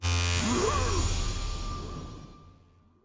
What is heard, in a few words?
treasure troves reveal rare; troves ceremonies; tft